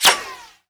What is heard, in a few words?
impact helmet ric; Escape from Tarkov